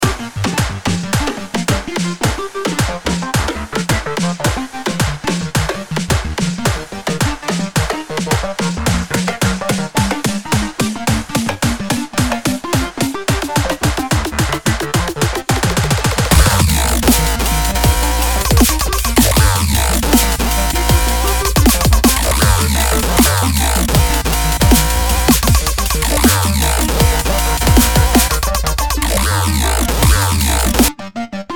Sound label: dubstep